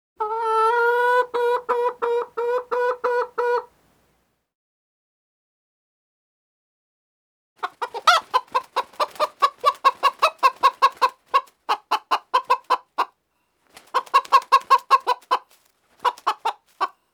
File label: Animals Birds; Sound Ideas 6003